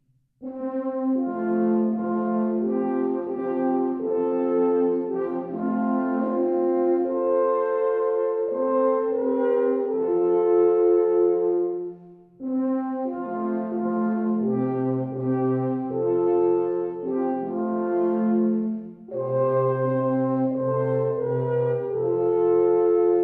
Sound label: Loop moeller loop